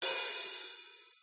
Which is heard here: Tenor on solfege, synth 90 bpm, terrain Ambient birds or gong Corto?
gong Corto